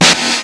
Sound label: Kawai K1